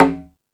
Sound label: Latin Layers, drum machine